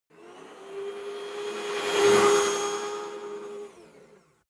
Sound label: fly by cleaning robot passing